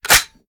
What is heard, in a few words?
raise first close; viper